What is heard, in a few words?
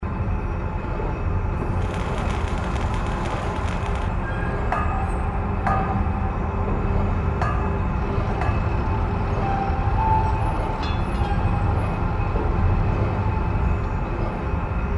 Amb Subpen Int